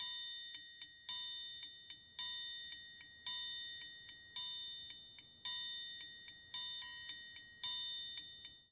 Ballad Triangle-110bpm; Latin Percussion; Ableton; Factory Packs

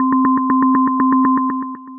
Pitter Patter, Synth Rhythmic; Ableton; Glitch and Wash

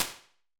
bathroom, ambience and small rooms; Convolution Reverb; IRs; Ableton

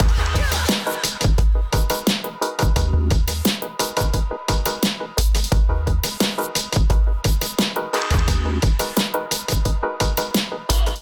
Kit groove 87 bpm, Drums; MIDI Clips; Ableton; Chop and Swing

